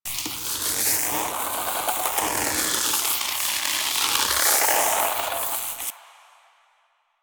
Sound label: Kumihimo String Loop; Warframe; Koumei